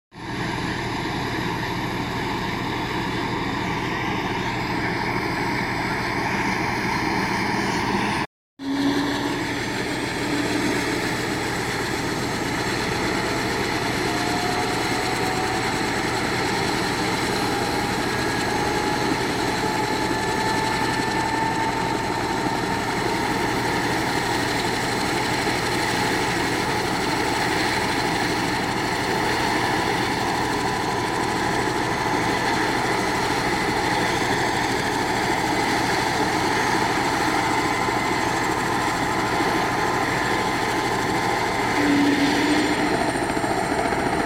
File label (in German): helikopter